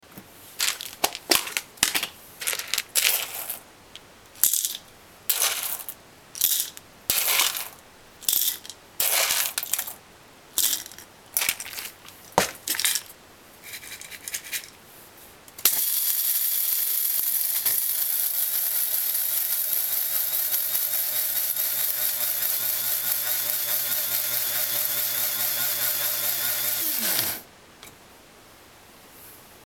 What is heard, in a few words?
coffee grinder